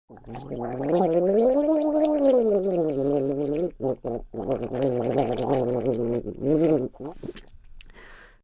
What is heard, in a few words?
Gargle Vocal, Gargling, male, vocalizing; (human voice); Sound Effects:Voice; VoiceBox